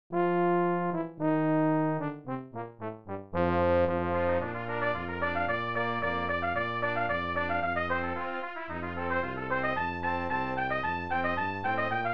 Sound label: clarinet polka